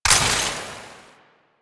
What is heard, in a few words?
Ammo Pickup Gas; Ammo Ability; DE; Warframe; Frumentarius